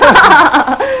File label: Laugh; awful truth